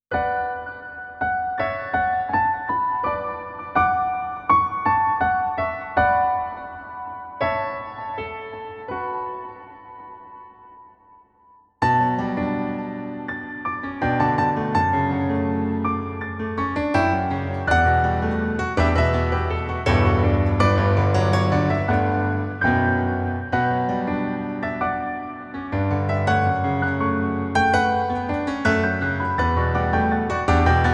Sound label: piano ver.; Saigo no Yakusoku no Monogatari Original Soundtrack